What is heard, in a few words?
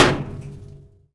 Metal barrel hard; DoomRTX